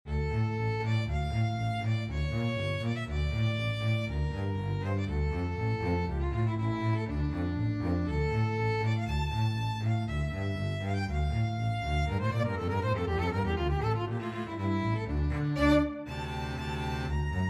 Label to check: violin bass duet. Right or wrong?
Right